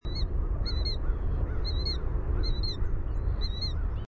Killdeer killdeer

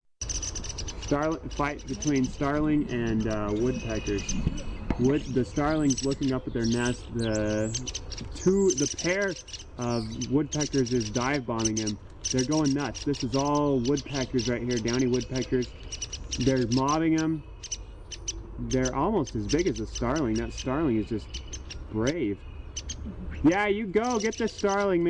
DOWO peek

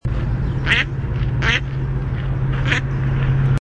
MALL quack

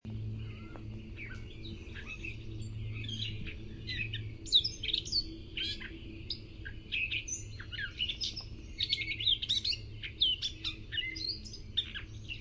GRCA song

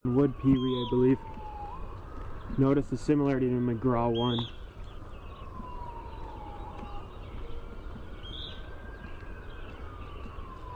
WEWP bzew

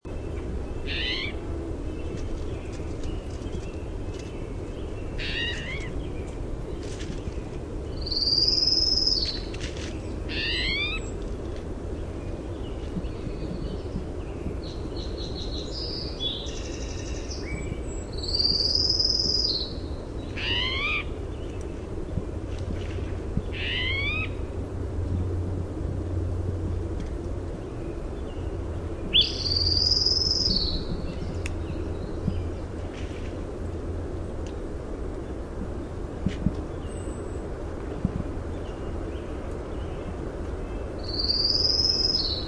SPTO mew Primary Song

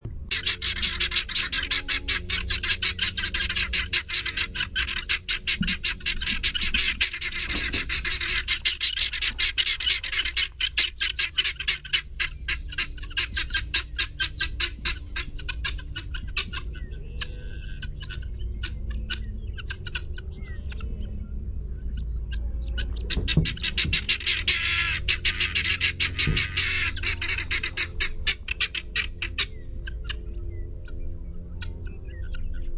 helmeted guineafowl, Numida meleagris; Galliformes Numididae; FtC-BoxElderC

